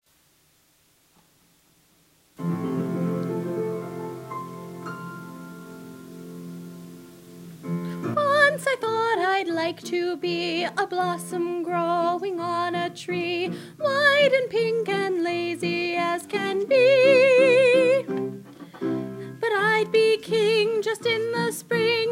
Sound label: No One Else But Me Vocals